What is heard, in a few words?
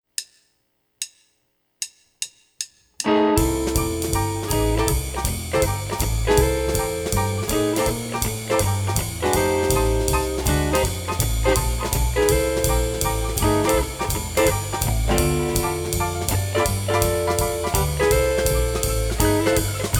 Quick Swing in C, harp; Blues